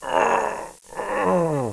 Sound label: die